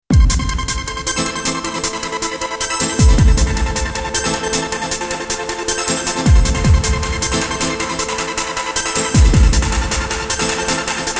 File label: rhythm